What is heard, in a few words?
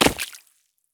landing puddle; Escape from Tarkov